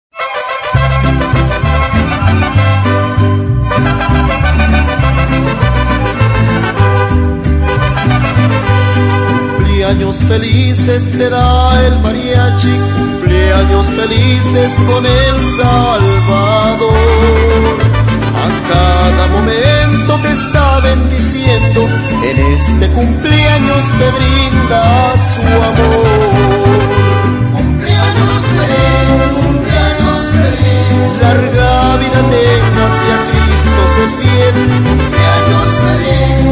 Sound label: Christian Mariachi; Guatemala